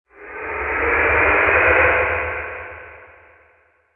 Seidstar die; Seer; GamigoNA

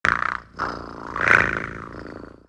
Fox Action

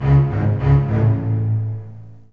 Run; SantaMaria; Seer; GamigoNA

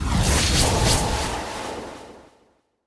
Guild Win; Seer; GamigoNA

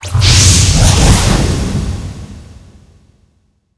Summon; Wishis; Gamigo

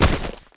Earth Nerpa Foot

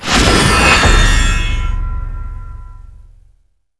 Power North Breeze

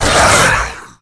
Silberk Die; Seer; GamigoNA

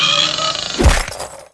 Kid

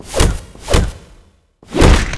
Mud Man atk

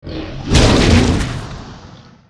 spike horn skill

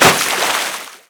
ball water skill

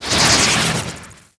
Light Wind

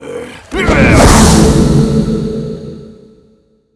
ice lich

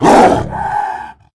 Spake Dog Atk

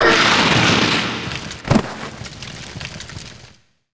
Old Fox die

